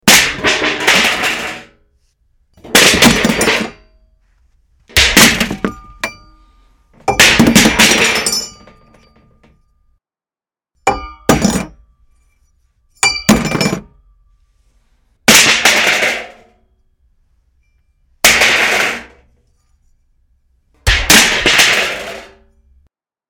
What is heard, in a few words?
metal fall